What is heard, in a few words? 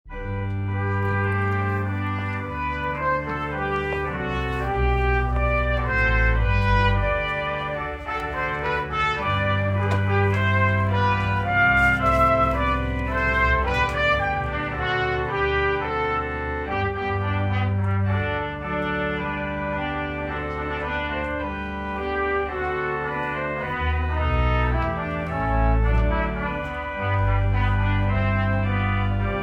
organ and trumpet prelude